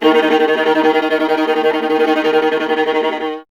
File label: viola D#; Miroslav Vitous; Solo Instruments VOL-1